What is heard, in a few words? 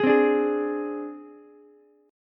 G sharp Major Flat 5th, Ukulele; G#Mb5 strummed; JGuitar